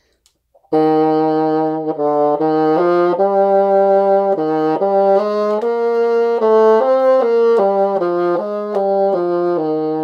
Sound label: Bassoon Etude; 7th Grade